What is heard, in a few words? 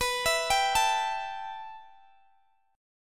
B 7th Suspended 4th Sharp 5th, Guitar, DADGAD